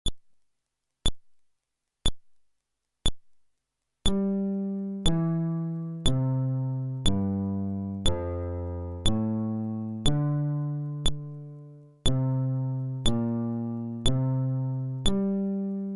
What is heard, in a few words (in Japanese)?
フレットレス